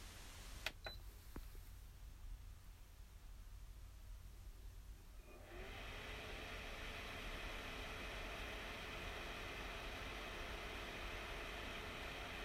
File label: Projector, Slide projector humming